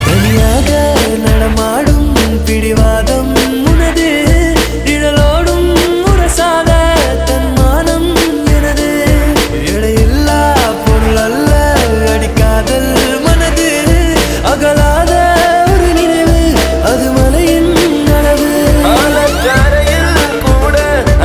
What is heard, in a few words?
Tamil Movie Ringtone